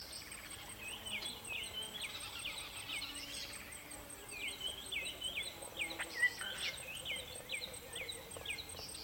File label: dawn, daycycle; cod4; openwarfare